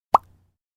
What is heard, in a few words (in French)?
Message Drôle Mignon